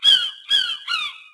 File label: seagull far; ambient birds; World of Tanks Blitz